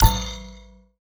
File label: card game ui notification gong; esmteam